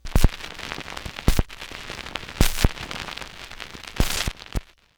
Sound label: Record End, Vinyl Record; Record Noises